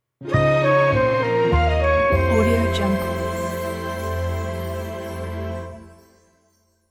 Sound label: jazzy sitcom stinger transition